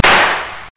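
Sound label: pistol shot without silencer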